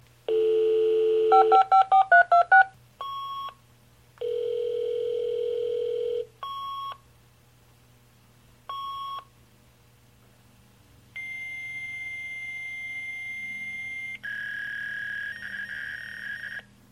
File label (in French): porteuse